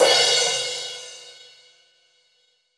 New Brush Crash, Cymbals; Roland BK-5

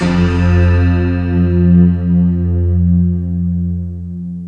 ASIALON; Rubber Chicken Software Sample Bank

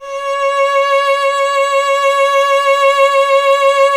STR cello C#, Cello; Miroslav Vitous; Solo Instruments VOL-1

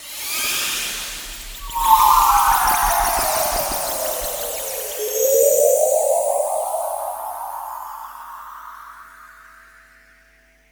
sonic whale; Best Service Dream Experience; SONIC WHALES

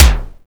SDS5 KICK F2; Greytsounds VOL-1; SDX KICKS

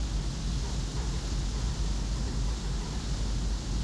Ambience Loop, outdoor

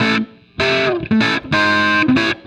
BLUES GUITAR

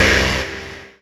Dance Mega Synth; Best Service; 90 s Sample CDs

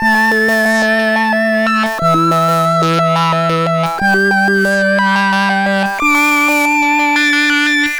C64 Synth

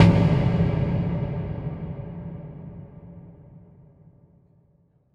Tom Hit, Impact Drum Hits; Sonic Foundry (Sony Creative Software); The Electronic Music Manuscript Collection

